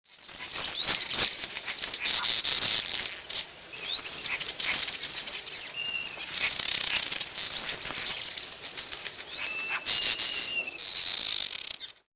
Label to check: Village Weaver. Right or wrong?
Right